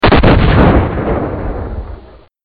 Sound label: Gunshot